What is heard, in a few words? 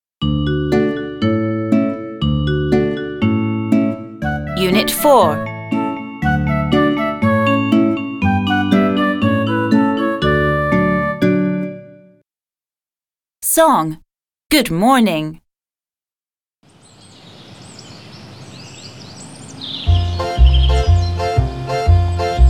Good morning song